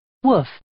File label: woof